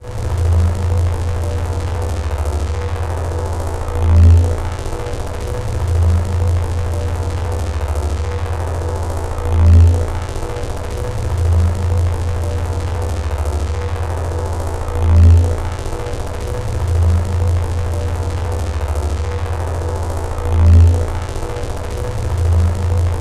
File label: ambience synth atmosphere; BlueZone; Spectral Density Cinematic Samples